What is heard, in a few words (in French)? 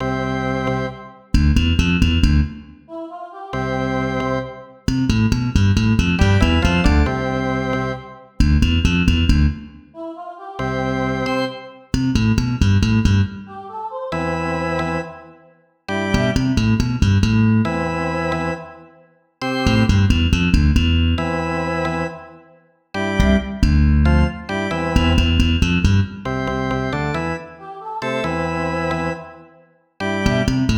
melodie